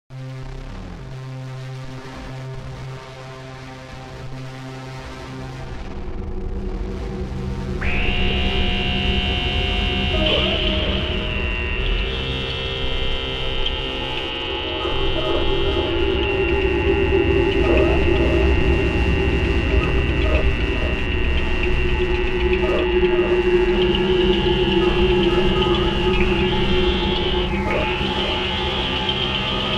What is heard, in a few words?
drone